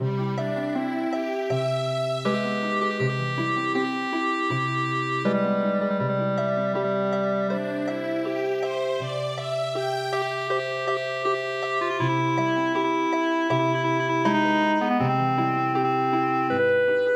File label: Healing Harp, midi; Nessun Dorma